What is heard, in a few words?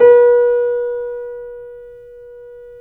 C7 PP, The Ultimate Piano Collection; East West